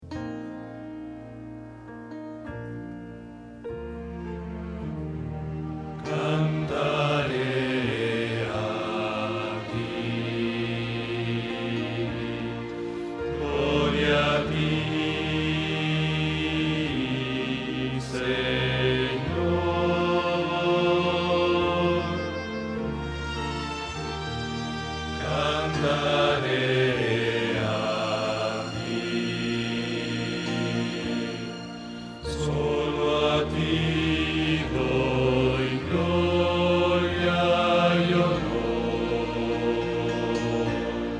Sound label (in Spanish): Bajo